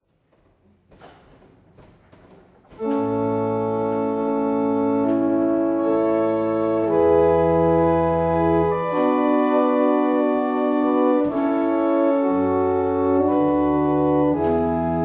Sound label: Single Chant, barrel organ; Letheringsett